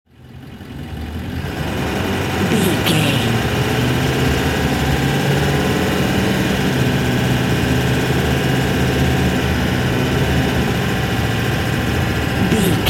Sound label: ambulance int drive diesel engine fast slow